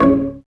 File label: Block; msntv2